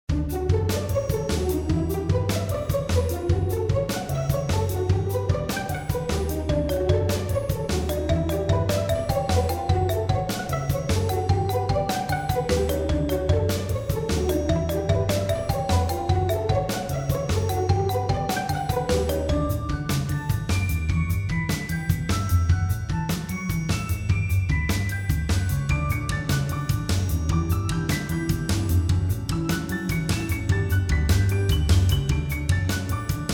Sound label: cheerful bird